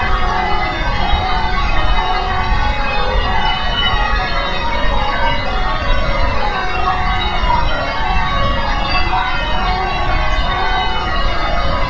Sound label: bells